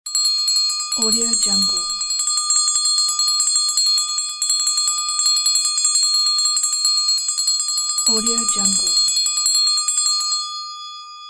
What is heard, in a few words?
school bell